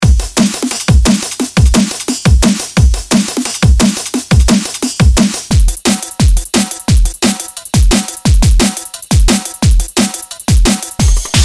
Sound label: extreme dnb beats